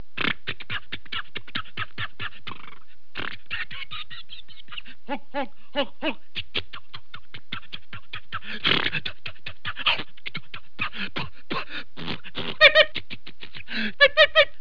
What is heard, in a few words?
JALOPY